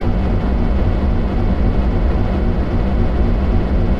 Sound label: Sci-fi Hover Bot Loop; SFX Kit; Sci-Fi Loops